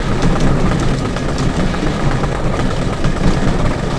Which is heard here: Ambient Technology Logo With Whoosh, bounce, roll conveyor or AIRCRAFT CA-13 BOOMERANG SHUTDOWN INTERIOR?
roll conveyor